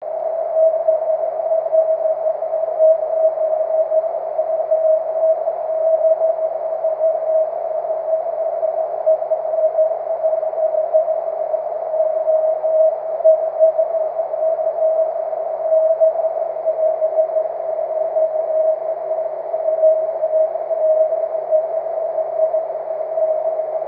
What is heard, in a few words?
cw, 23cm; dl8obu